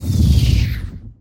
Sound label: In Fire; KriptoFX; Realistic Effects Pack v1